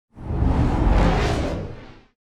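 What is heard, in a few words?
Summon Pendulum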